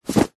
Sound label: clothing jeans denim pants trousers drop carpet; zapsplat